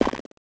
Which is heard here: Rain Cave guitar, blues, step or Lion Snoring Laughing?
step